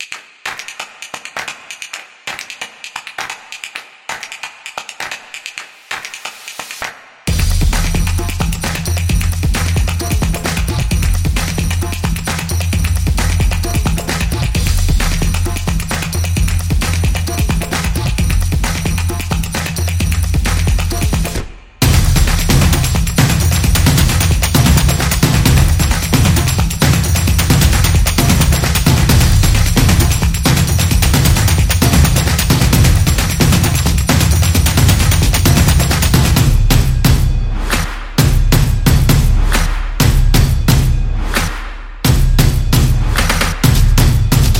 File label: The Percussion Beat; soccer drums